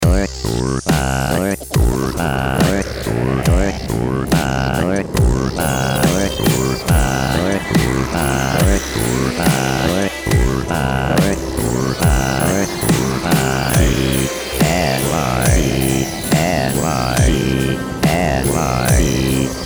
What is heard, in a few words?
harmonic; dorkbot; theme songs